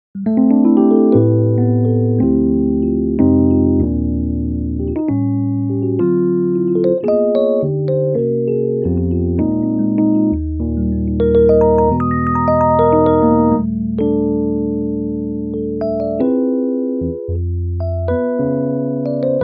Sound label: real mk1; native instruments electrik piano